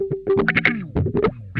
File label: HIDE N SEEK, Funk Guitar; 90 s Sample CDs; Zero G